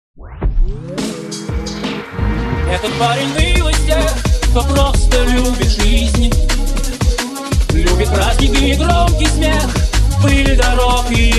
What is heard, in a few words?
DNB